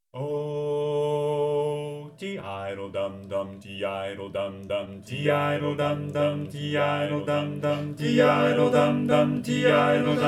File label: lead predom; 2024 spring show